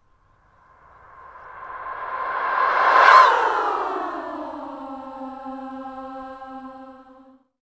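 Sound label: female swoop reverse, voices; SWOOPING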